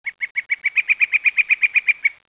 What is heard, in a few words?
Birds